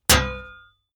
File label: metal impact sword plate